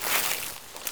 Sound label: grass step, footsteps